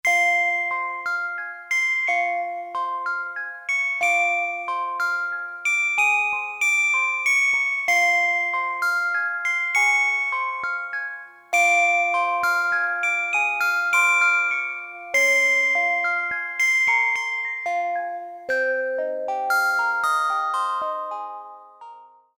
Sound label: Vel Pad; Korg DSS-1; Guitars